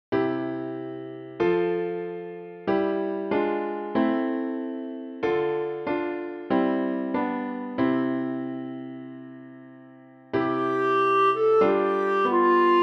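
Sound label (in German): Alle Jahre wieder Bassklarinette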